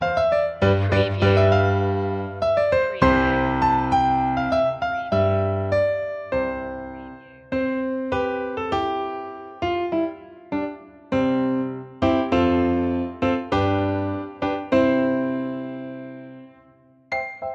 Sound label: piano; joy to the world; media music now